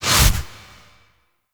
Melee Damage Multiplier, Whooshes; Warframe; Weapons

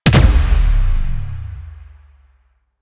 Gunbrella Alt Punch, Weapons; Warframe; Tenno; Koumei

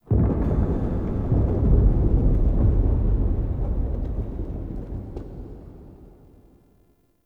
Room Enter; Puzzles; Circade

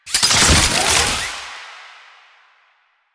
Soldier Zipline Start; Enemies; Soldiers; Warframe; Lasrian

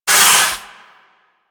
Forest Water Pump Engage; Ambience; Props; Warframe; Grineer Forest

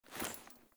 Foley Walk, Clothing

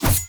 Melee Block Start; Warframe; Weapons

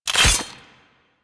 Drone Blade Hit; Weapons

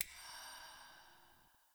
UI Default Map Hover

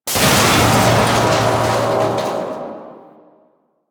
Water Pipe Burst; Warframe; The New War; Narmer Outpost

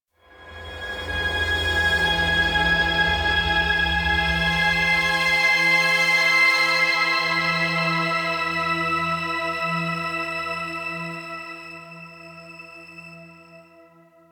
Railjack Hangar Enter Stinger; Warframe; Clan Dojo; Dojo Connectors

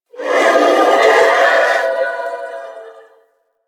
Sentient Symbilyst Attack; Enemies; Barks

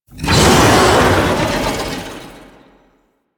Lasrian Tank Infested Vocal Attack Swipe; Enemies; Barks; Warframe

